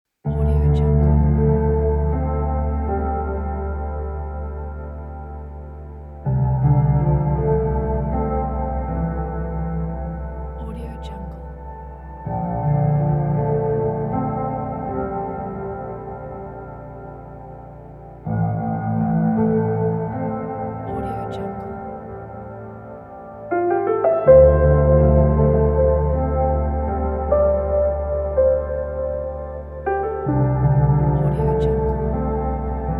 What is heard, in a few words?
piano soundscape